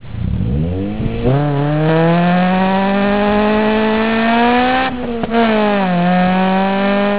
INTEGRA (shifting to 2nd gear), honda

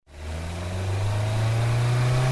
Sentra SE-R, nissan

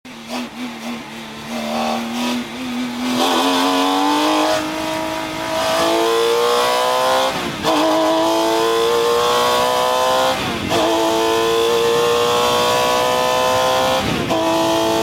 GT3 Cup, porsche

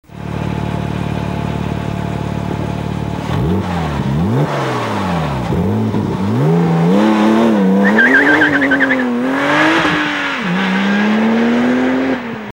GT2 - acceleration, porsche

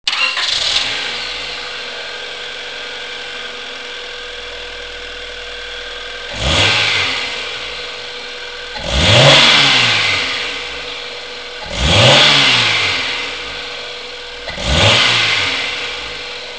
CIVIC, engine sounds; honda

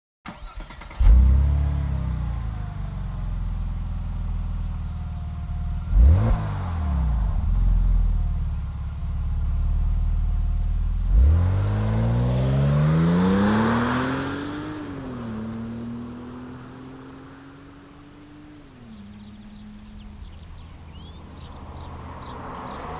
996 turbo - acceleration; porsche

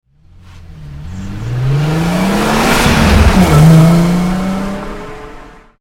Golf R32 acceleration, volkswagen

